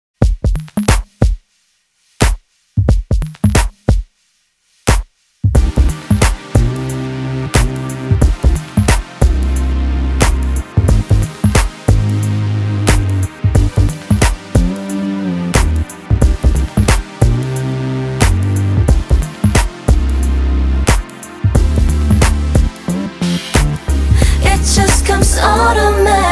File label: BGM